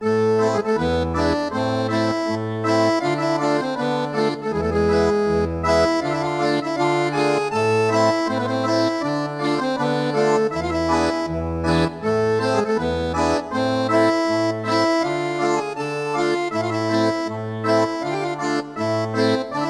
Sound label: Town Tavern